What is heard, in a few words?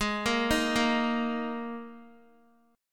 Ab Suspended 2nd Guitar; arpeggiated; Absus2; x,11,10,8,10,x